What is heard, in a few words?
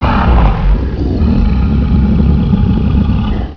garg alert